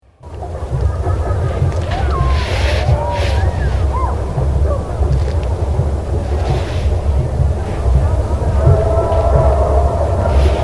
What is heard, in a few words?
Drums; Utah 2003; Rainbow Music